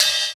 Cymbal, JUNGLE BREAKS DRUM SOUNDS

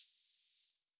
HH, HIHAT

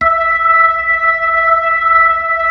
B-3, Club; Roland LCDP10 Keys of the 60s and 70s 2; Club Ch. Xfde

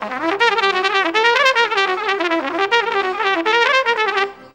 ORIENT TRUMP; Best Service; World Colours

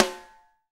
SD, KIT Real-Kit; 90 s Sample CDs; Sound & Vision - Gigapack I CD 1 (Roland); Real-Kit m 5

